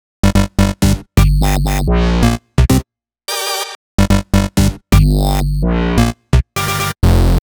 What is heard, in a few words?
128BPM Cuts; Weekend; Vengeance Samples; Weekend Kit